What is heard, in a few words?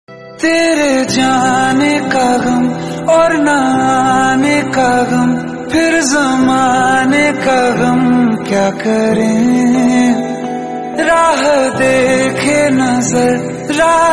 sad rington hindi